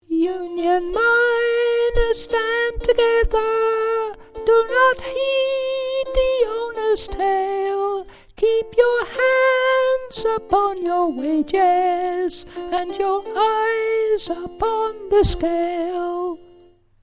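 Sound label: union miner Chorus Soprano Falsetto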